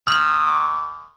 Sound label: cartoon down